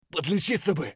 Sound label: bear low hp; eft